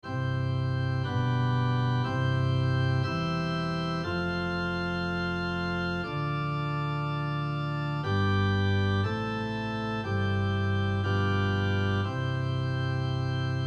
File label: Harmonic Progression; y12 sem2 exams